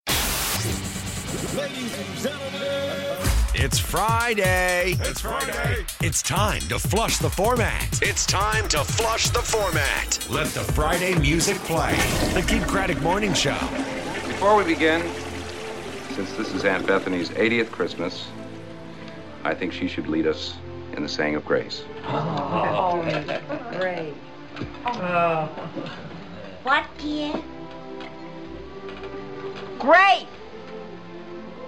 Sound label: christmas mix